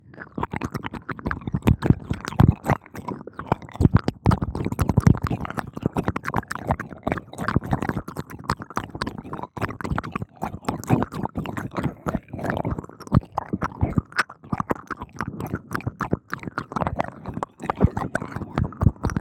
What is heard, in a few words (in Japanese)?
左右同時耳責め; 耳舐め&吐息ぐっぽり10時間♪